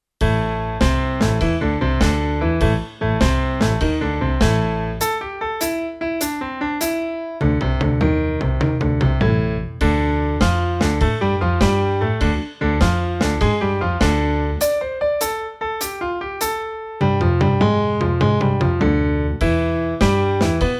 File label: Bluesy Riff Etude